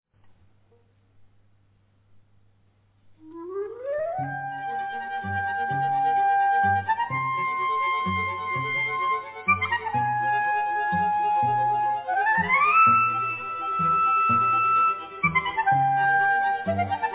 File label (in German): klarinettenquintett anfang